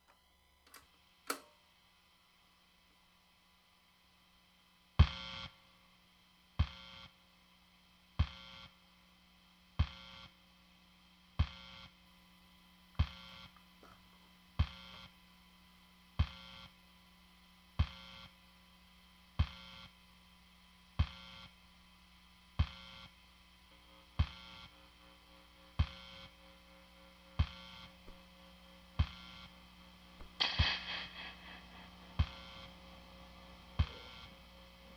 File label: Electricity Soundscape